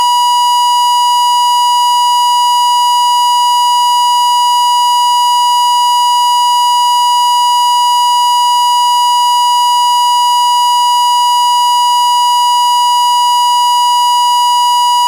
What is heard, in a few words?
euro toxic steady horn; DP3000